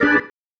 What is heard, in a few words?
Stab One Shots, Organ; Sonic Foundry (Sony Creative Software); Joe Vitale Organ Donor